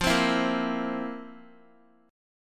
F Major 7th Suspended 4th, Guitar; strummed

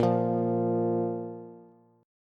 A sharp 5th A sharp, Bass; x,x,8,10; down

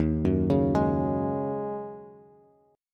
Eb Minor 11th, Bass Standard; Ebm11 arpeggiated; 11,9,11,13; JGuitar

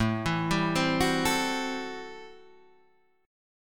A-Minor 7th Add 6th, chord; Am7 Add6 arpeggiated; JGuitar

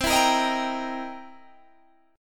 C 7th Sharp 9th C Guitar DADGAD

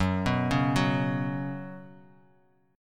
F sharp Suspended 4th, Bass Standard; arpeggiated; F#sus4

